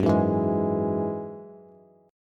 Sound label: Gb 7th Suspended 2nd Gb Bass, Gb7sus2 Chord; strummed; JGuitar